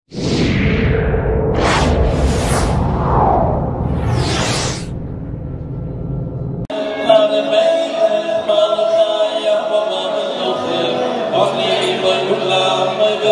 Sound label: choir acappella; mezamrim